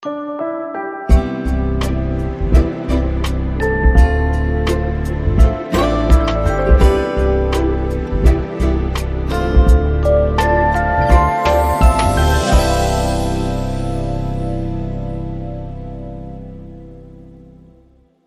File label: Long Sting No Crackle